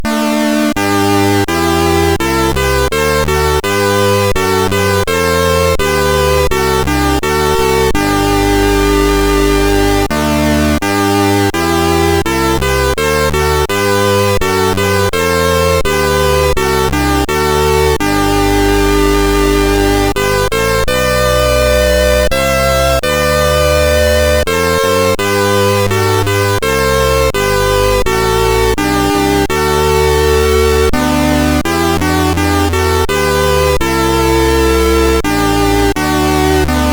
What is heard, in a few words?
Dutch Anthem, sid, MOS6581R3; Wijnhoven Joachim